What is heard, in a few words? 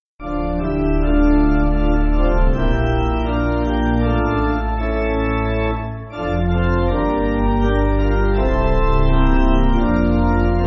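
Pipe, Music Backing Files for Churches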